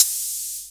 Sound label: OP Hihat; Open Hihats